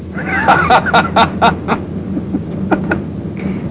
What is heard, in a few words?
laugh